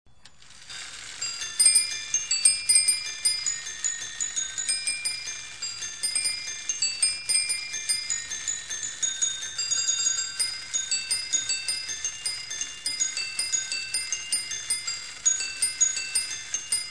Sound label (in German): glasglocken; tonen